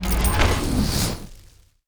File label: onager attack